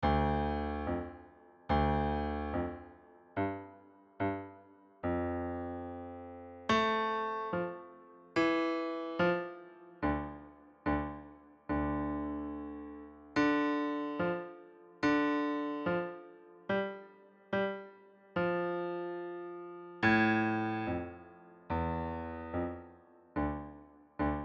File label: Solo